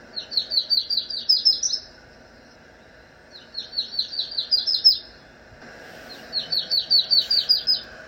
Yellow-rumped Warbler

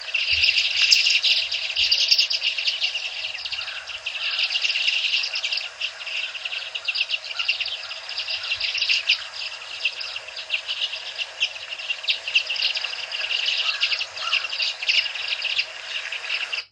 Common Redpoll

